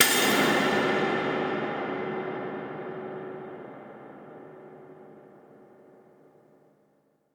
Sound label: Hits & Explode